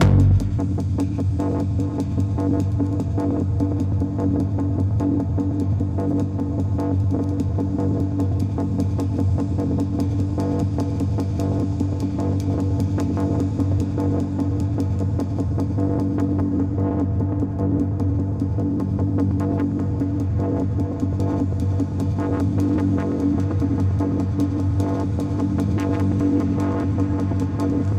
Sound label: Finale Music Tension Pulse High Tempo; Battlefield 3